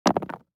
DICE on Neoprene, Throw and Roll, Standard, 3 Three Dice; Samples; ArticulatedSounds; DICE Sfx Party (Extended)